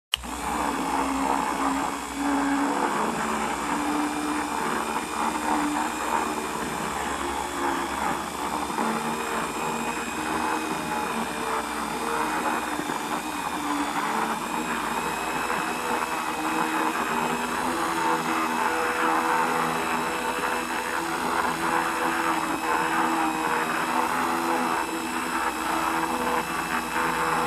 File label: Mixing Dough with Hand Mixer